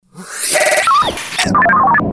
Flush Self; Best Of N2O Library; Breakers & Transitioners

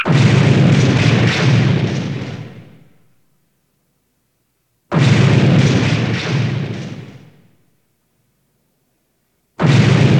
Phasers Striking Hull; Star Trek Original T. V. Series Sound Effects

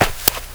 DIRT, FOOTSTEPS; 1000fx

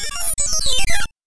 tones

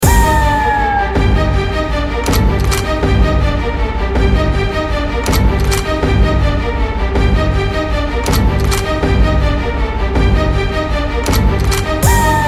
Instrumental

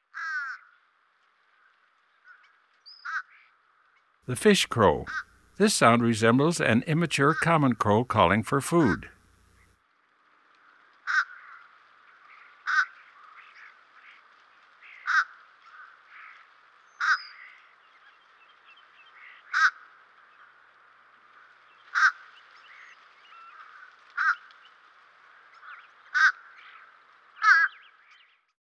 Fish Crow; Eastern North America; Dan Gibson; Wildlife Identification By Sound

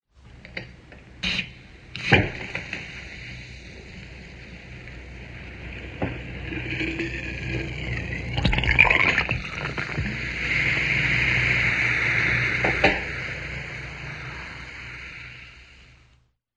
Open Bottle, Pour into Glass; SOUND EFFECTS1

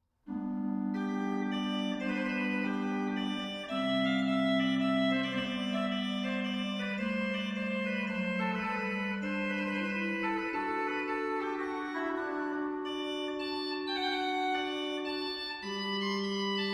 Sanctus Recit de Cornet

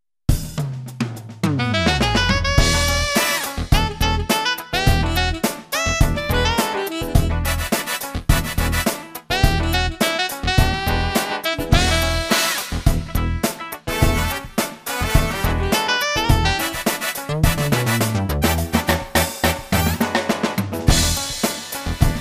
SD-90 Jazz Funk; SD-90 MIDI

